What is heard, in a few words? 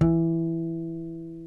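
E2 HARMONIC, CLASSICAL GUITAR; Northstar - New Gold VOL-2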